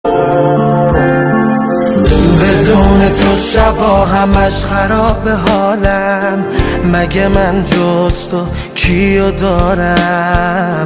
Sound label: ringtones